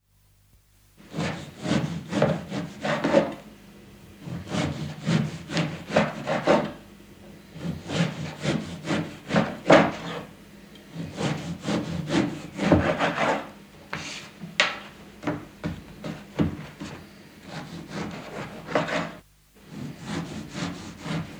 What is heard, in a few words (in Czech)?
krájení chleba